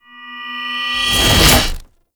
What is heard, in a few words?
Strike Leap Charge Up; Boss; Enemies; Abilities; Warframe; Oraxia